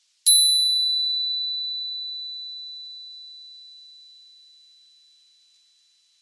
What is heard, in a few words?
Bell